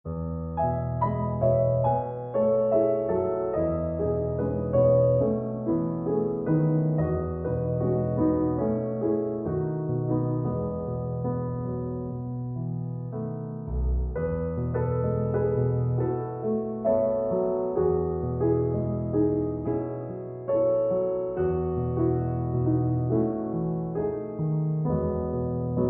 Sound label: Solo Piano